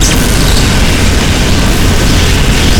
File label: fire crit; weapons; tf2classic